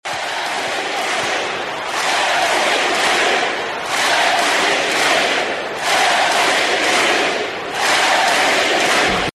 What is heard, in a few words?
mvp chant